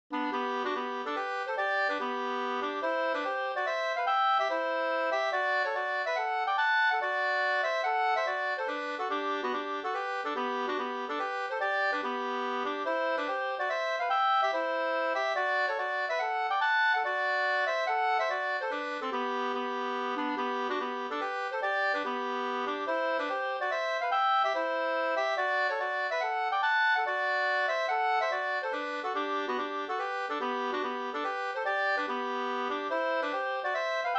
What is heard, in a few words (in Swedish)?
mazurka brannvinslat; Soderbarke Da 2st